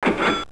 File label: CASH REG